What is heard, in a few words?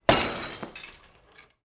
Figure Break